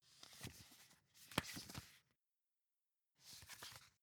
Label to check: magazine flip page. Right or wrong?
Right